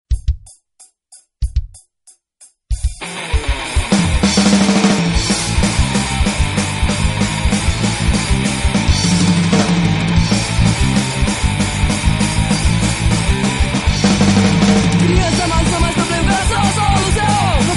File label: punk rock